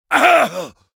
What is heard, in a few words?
Male Fight Damage; Mighty Party; Android